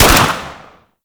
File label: Heavy Space Weapon